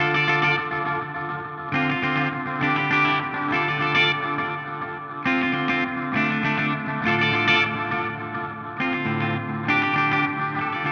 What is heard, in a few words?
MXR Carbon Copy